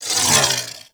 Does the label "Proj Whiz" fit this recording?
Yes